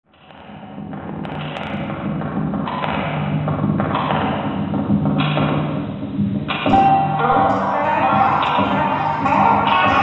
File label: ELETTRICO drum excerpts